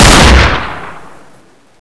spas12 shoot, weapons

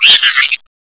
maggot flinch

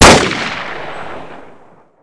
sniper fire

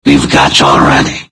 attack, paralyzed subject

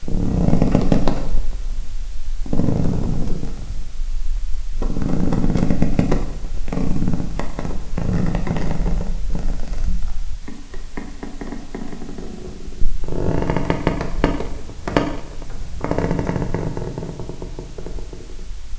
creaking; ambience

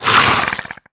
alert, headcrab

